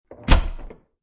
Door Lock Open, Using key to open house door lock; Miscellaneous Door Sound Effects; Sound Effects:Doors

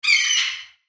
Bird Sandpiper Squawk Cry Cage; Animal; Bird Sound Effects

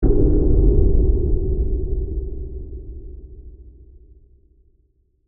Hit Ghostly Eerie, Horror; Production Element, Imaging Element, Accent, Transition

